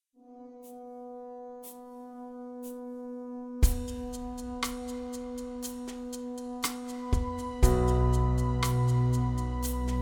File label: Backing track